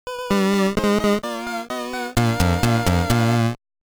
Item Found; just breed nes

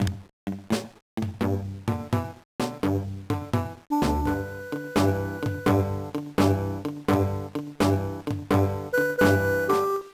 Country Jump Rope; Wario Ware Inc. Mega Microgame; 2003 gba

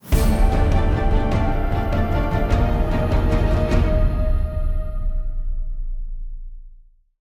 Victory Defeat; Endless Legend

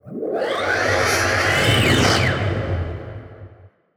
Skills Level Up

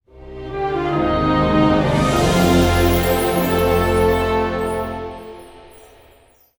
Event Fanfare; game rip; lego worlds monster pack

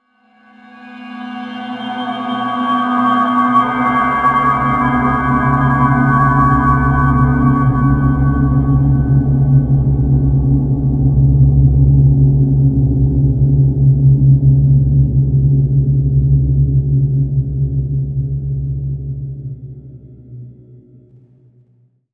environment stinger synth; gamerip

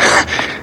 Fatigue Hard

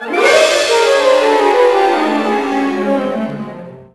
Death; legend of the guardians the owls of ga hoole 2010 nds

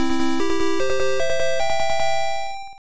Won Match; mahjong; famicom

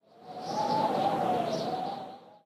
Amb Winter Windgust

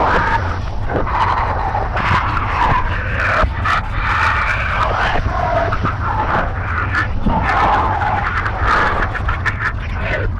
paint disappear loop stereo

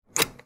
lotus lever

